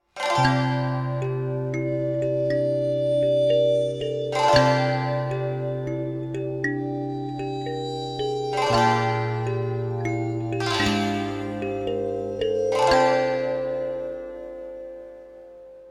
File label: night nature; curious expedition 2 macos ps4 switch windows xbox one xbox series xs gamerip 2021